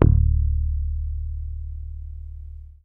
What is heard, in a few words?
HOF SUS D1, Hofner bass; Sampleheads; Will Lee Bass Library